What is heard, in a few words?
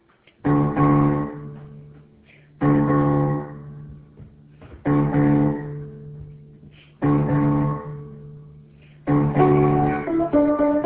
Piano